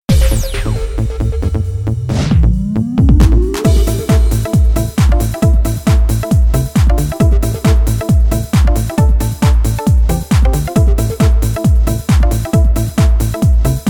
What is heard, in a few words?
rhythm